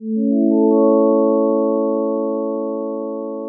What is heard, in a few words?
jingle placeholder